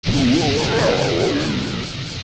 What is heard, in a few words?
Die; Anubis